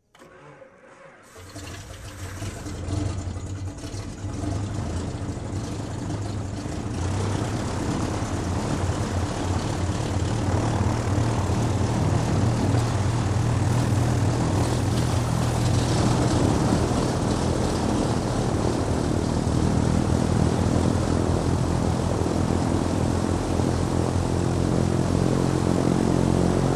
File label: Big Propeller Aircraft engine start up and taxi